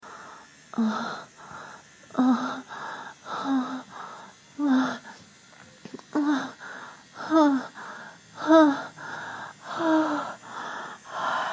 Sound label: orgasm